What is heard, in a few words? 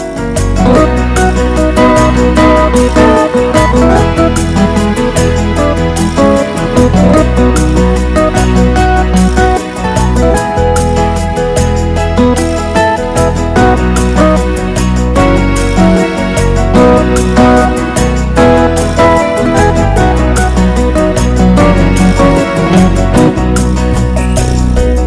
Pretty Sweet Guitar